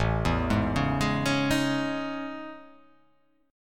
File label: A Major Sharp 11th